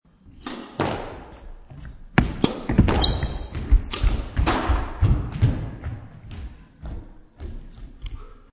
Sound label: Sports Squash Short Rally Serve Return Hit Back